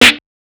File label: Snares, Alesis Performance Pad